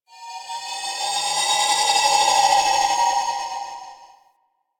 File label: Ship High Washed Bells; Warframe; Corpus Ship Remaster